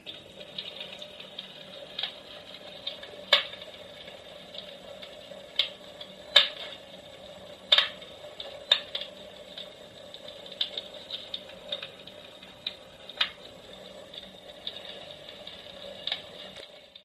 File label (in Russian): zvuk effect tresk potreskivaniya drov kamin; kaminy; real flame royal flame